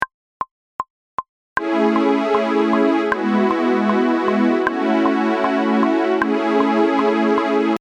SynStrings

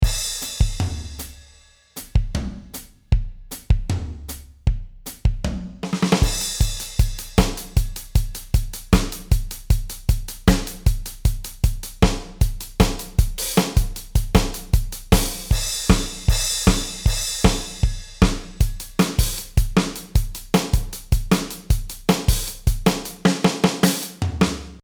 Drum

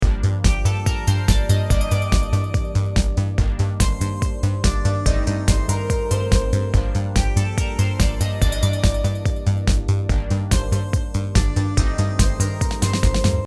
A melo ALL new

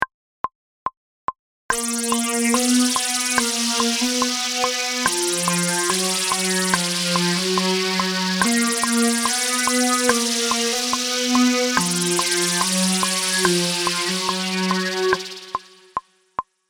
intro low

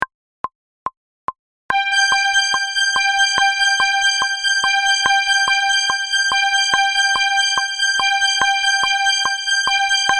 Intro Seq

